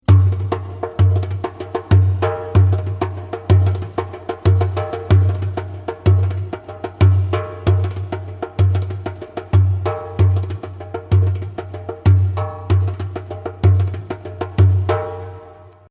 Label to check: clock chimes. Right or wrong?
Wrong